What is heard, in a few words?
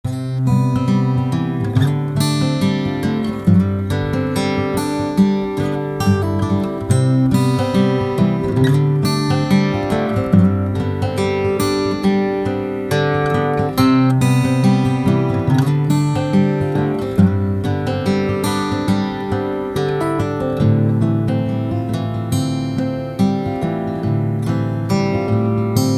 acoustic fingerpicking